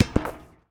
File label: Street Ball Metal Basket